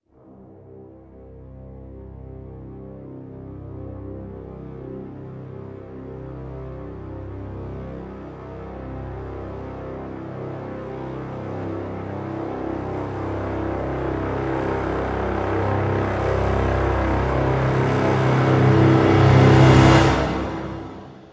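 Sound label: Crescendo Brass Swell Lo (C)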